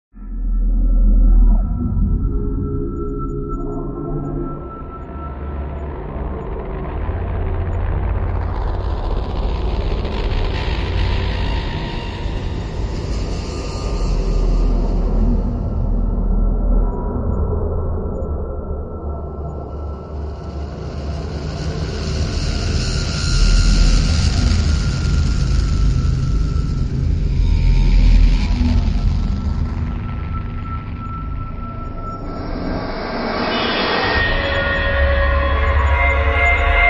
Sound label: Evil Alien Machine; Epic Textures vol. 1